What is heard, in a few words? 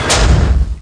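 metal hit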